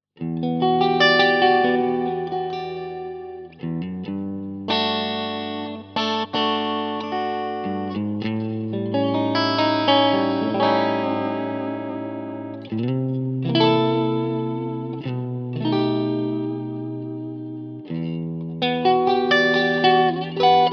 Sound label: Clean Fender Strat